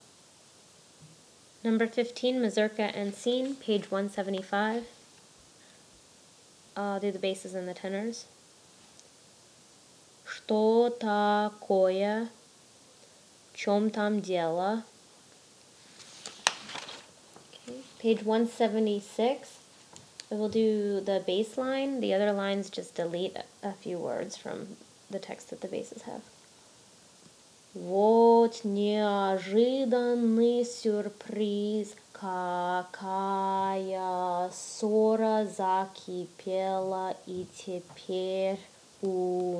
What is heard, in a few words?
mazurka and scene